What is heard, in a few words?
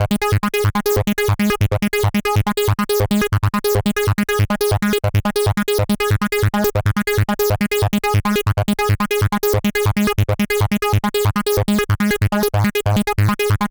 Sol-7 G#; Vengeance Samples